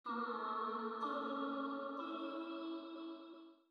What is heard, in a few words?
bugle